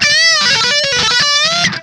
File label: string